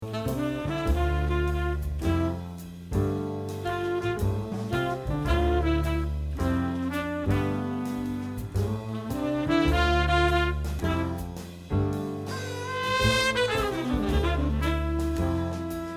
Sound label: Tenor Sadness